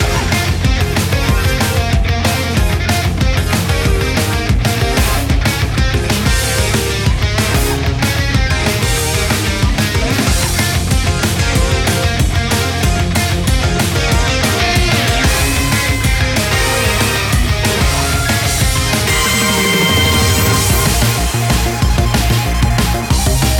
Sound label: Music Boss Scifi